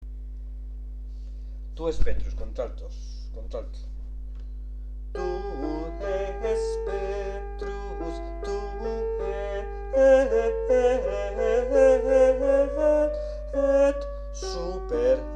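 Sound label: tu es petrus alto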